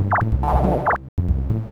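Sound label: synth electro 140 A; Techno; Keys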